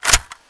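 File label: bolt back, rifle; sven; cof; guns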